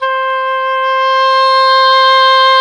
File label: OBOE, Orchestral Winds, Dry; Roland LCDP04 Orchestral Winds; Oboe 4-8; Oboe Dry 6